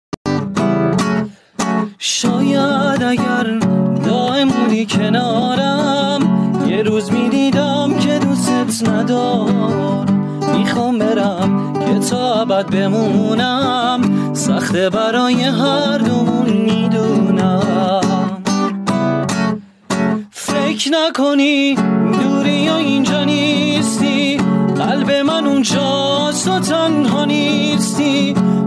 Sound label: Guitar Cover